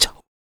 FEM PERC, Vocal Jazz FX

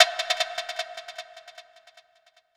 Echo Clacker; Sonic Foundry (Sony Creative Software) - Futurist Drum & Bass; Drums & Percussion

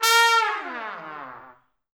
Pop Brass; Best Service; ProSamples vol.19; AKAI

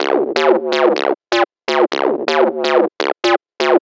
Dirty Bass; Sonic Foundry (Sony Creative Software); Underground UK House & Electro

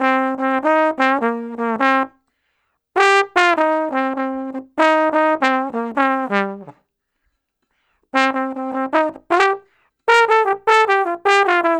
Trombone Solo, Jazz

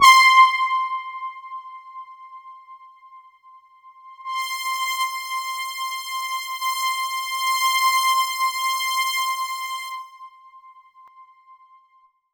Roland JV 2080 JUNGLE BRASS C5

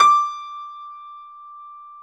D5 YAMAHA R, GRAND; NS GRAND

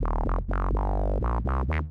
Bass synth squelch C, 125; PowerFX - Nu Jazz House

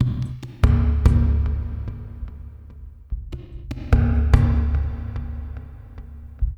BOLERINO, WET; Spectrasonics; Liquid Grooves 1 & 2

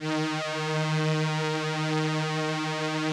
VP-330, String Machines; Best Service; XX Large Most Wanted 2

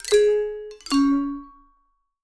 VIBE LICKS

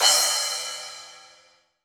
Splash Cym; Roland BK-5

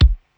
BD pss795 bass drum, DRUMS; Polyend; Legowelt

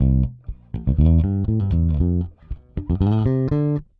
Pop, Bass

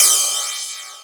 Ride, Club Techno Percussion Cymbal; Zero-G

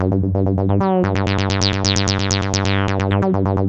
G2, synth line; 90 s Sample CDs; NiceBeats; SynthLine VOL-3

